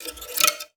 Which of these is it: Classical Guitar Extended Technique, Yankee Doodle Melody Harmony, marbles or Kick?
Classical Guitar Extended Technique